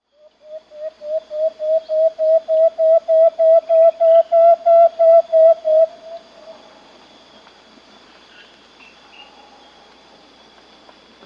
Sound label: Tachira Antpitta; S. America